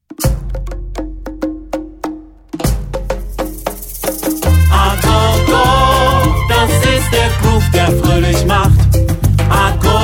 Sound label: Agogo